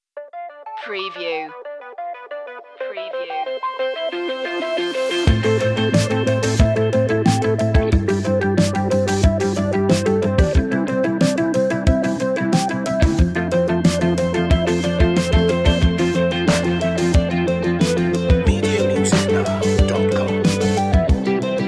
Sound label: analog epic